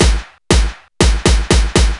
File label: Disco Flourish; gamerip; Happy Feet Two; nds